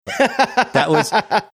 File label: laughing